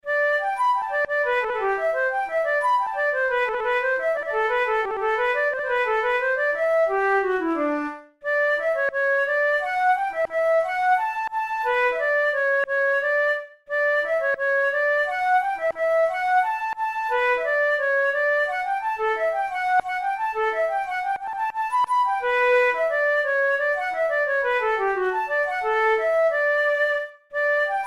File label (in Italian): capricci per flauto traverso; ruge